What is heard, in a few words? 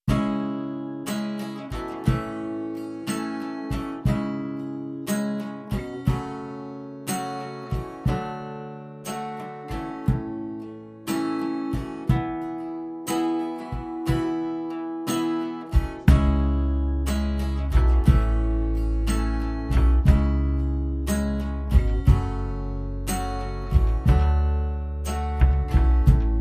Rhythmguitar Bass Kick Shaker